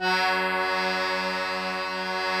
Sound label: KEY, Cajun Acord